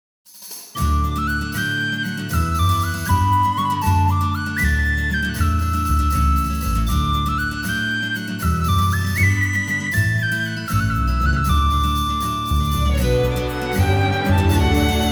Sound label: Slip Jig